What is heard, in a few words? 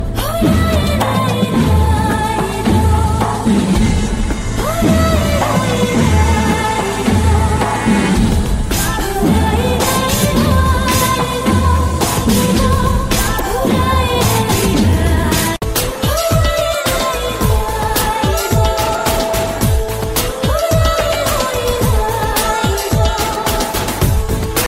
romantic music ringtone, bollywood